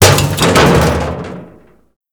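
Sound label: explosion metal barrel detail; Explosions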